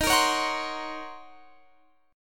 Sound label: D sharp Minor 13th; D#m13 strummed; x,18,20,18,19,20